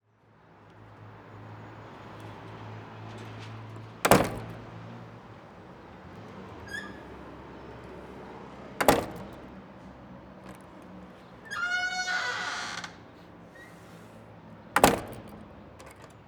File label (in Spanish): PUERTA MADERA abre y cierra